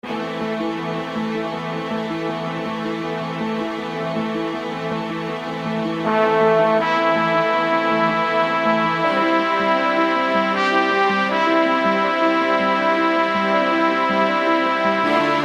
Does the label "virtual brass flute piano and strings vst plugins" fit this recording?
Yes